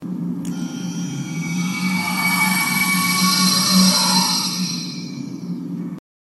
transporter; ent

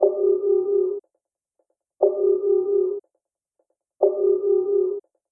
intruder alert

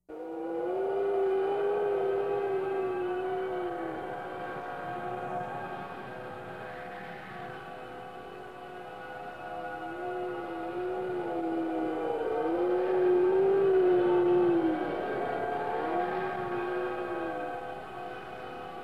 planet; background; TOS

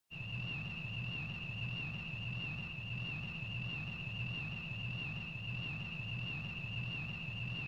tricorder scan

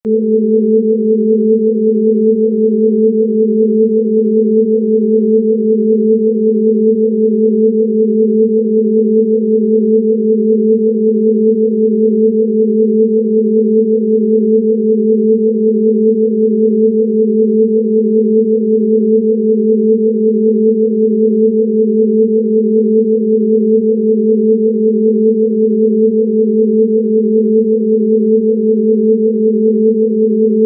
forcefield; background; TOS

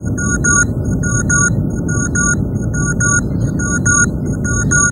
ambient bridge, computer

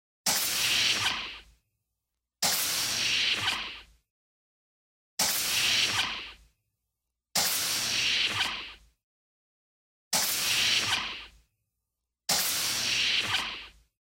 swoosh

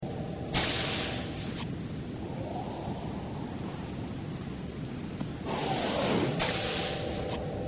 turbolift

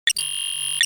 computer beep

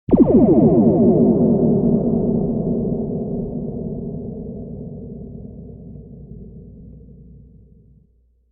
photon torpedo from bridge

